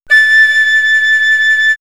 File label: Flute; X-Static Goldmine; backtothe70's